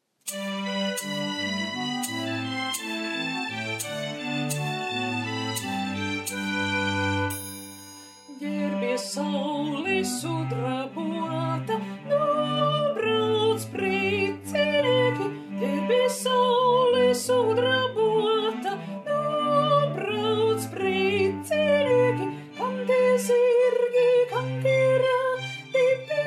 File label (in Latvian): gerbies soprans